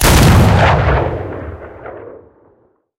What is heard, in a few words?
cannon 37mm Sh37 shot